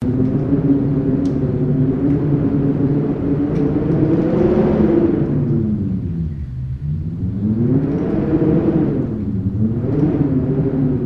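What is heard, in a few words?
WIND Interior Droan Door Emitter loop; gamerip; The Unfinished Swan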